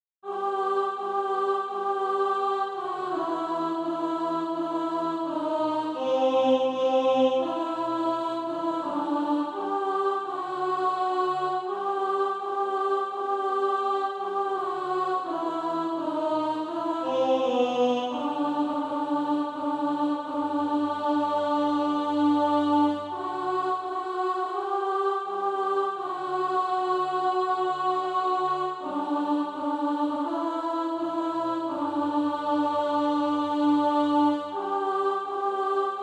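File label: alto, Sheet music (SATB) including Practise Music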